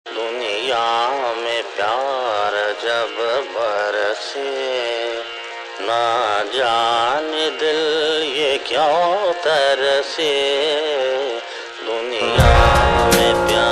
Blues Tragedy Tone; Bollywood; Delhi Belly

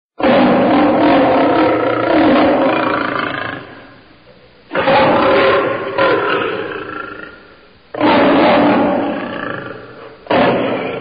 tiger; Pets And Animals Ringtones

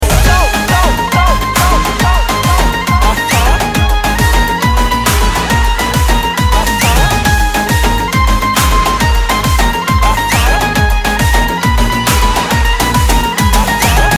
Instrumental Ringtone; Bollywood; Baaghi 2

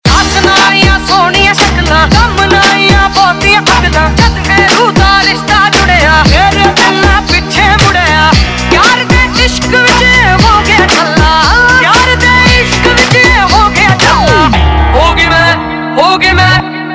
Ringtone, Punjabi

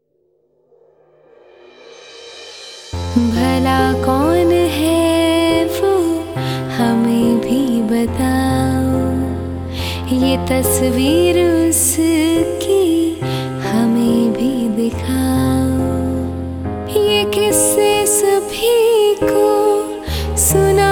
Female Cover

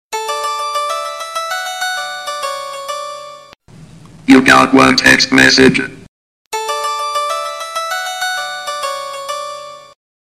Funny Sms

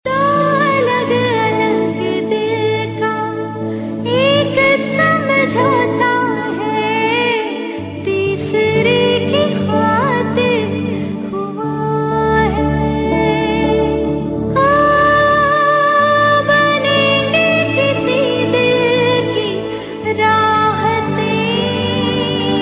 Sad Version

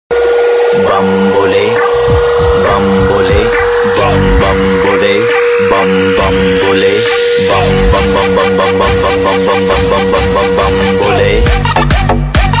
Edm, Ringtone

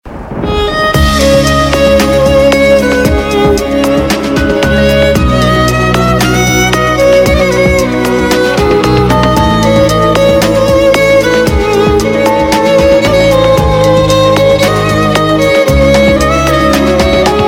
Violin Cover Instrumental; Bollywood Hits (2014)

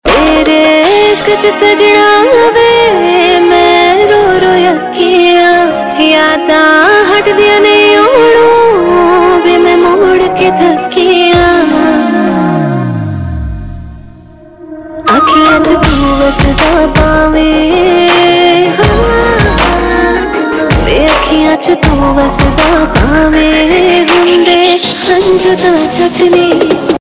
Ringtone, Punjabi

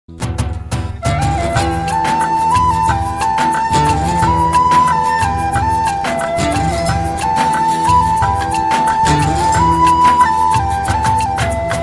Love Flute, Valentine Tones, Ringtones; Valentine Day Special (2015)

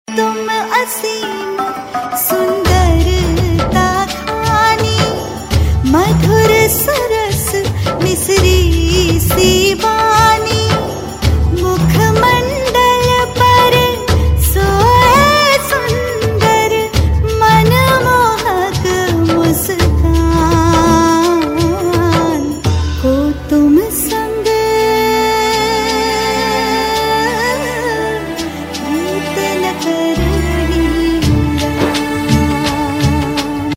Moon Bhajan; Siya Ke Ram

